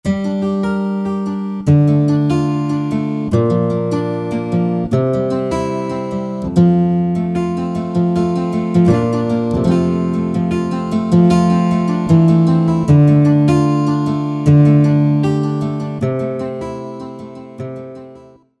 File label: Nylon Gtr; Generalmusic Equinox